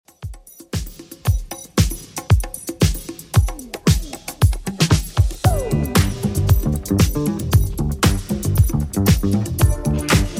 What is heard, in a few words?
80s Redrum 115 bpm